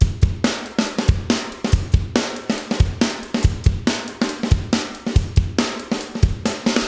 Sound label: Amen Break Piccolo Open Hat Room